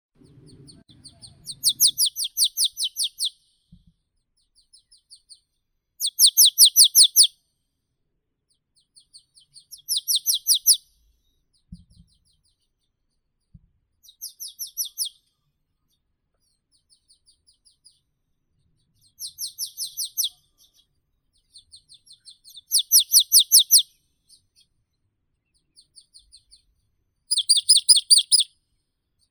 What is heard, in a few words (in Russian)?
gaichka chernogolovaya poecile palustris